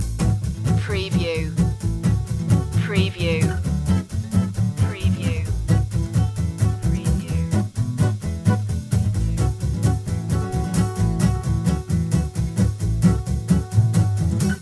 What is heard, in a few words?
hop loop